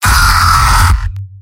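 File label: pain severe, male; demoman; tf2; garrysmod; mvm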